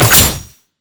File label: equilibriumstrike; LoL; irelia